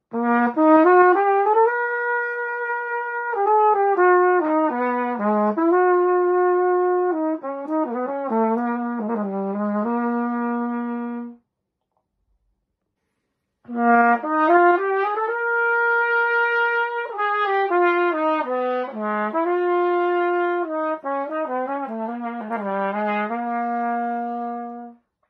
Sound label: Trumpet